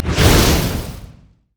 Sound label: flame breath dragon; LoL; shyvana